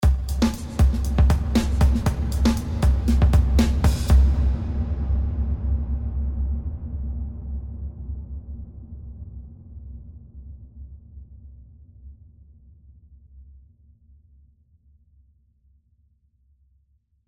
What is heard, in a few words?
BIG HALL